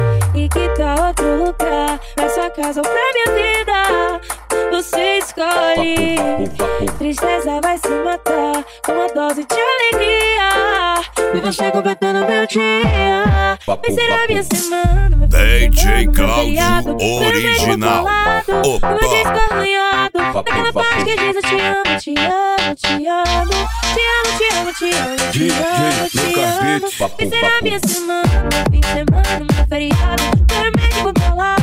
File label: sound car